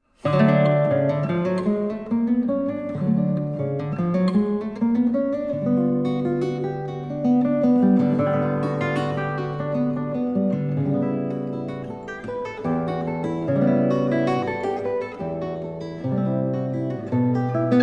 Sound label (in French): Etudes pour la guitarra No. 3 Des arpèges Un peu animé